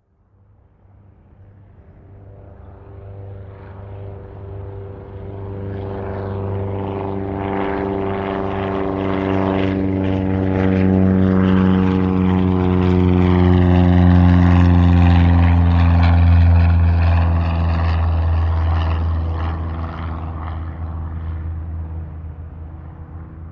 Airplane Large Prop Plane Take Off, A large propell; A large propeller aeroplane take-off. Good as a World War 2 aircraft soun